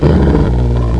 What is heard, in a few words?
GRRR